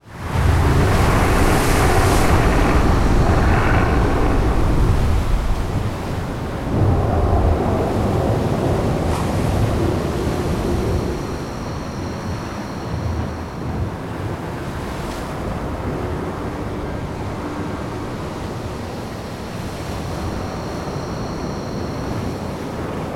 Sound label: BGM Environment Ship; God Eater Resurrection; PlayStation Vita